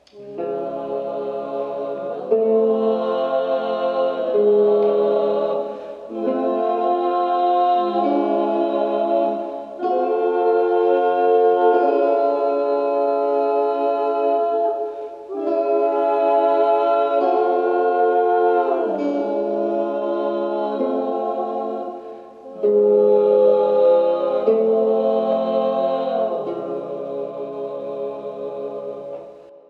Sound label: Vocal Hexanies